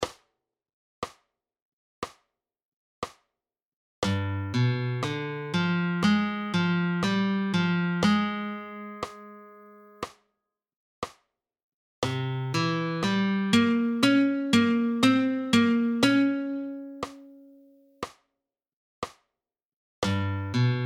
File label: Alzapua